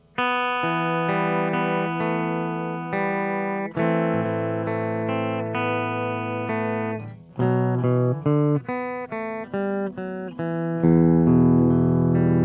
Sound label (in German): clean steg